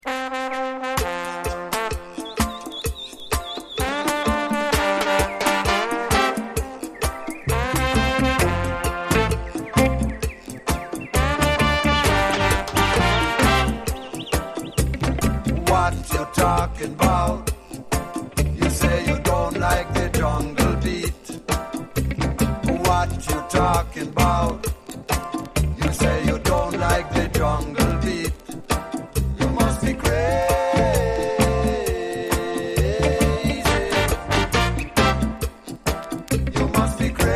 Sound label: Jungle Music